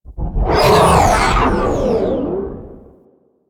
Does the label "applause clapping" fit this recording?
No